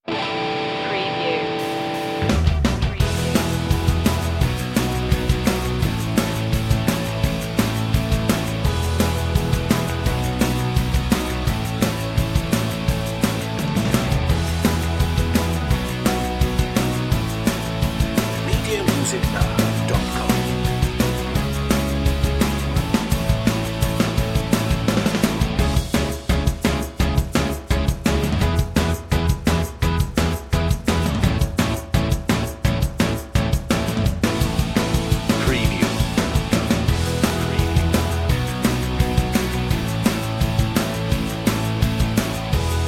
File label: young and carefree no melody